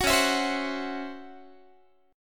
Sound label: C sharp Minor Major 7th Double Flat 5th Guitar; C#mM7bb5; strummed; 21,21,19,22,22,x